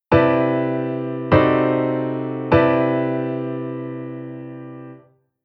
Chord Tension Dim7

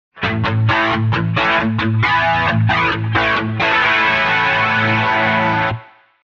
guitar Chorus drive

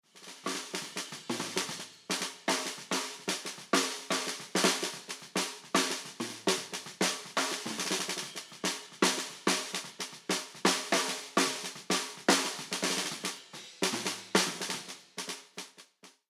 Drums SD F6 On

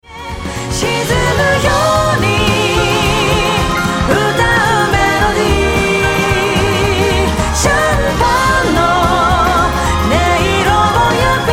Vol Automation Strings Auto Off

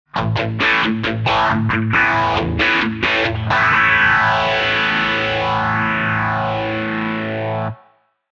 guitar Phaser drive

